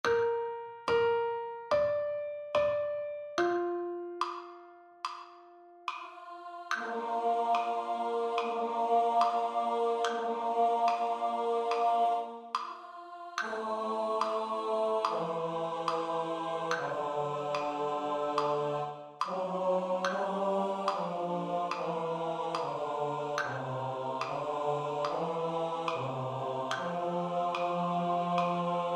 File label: BASS STUDY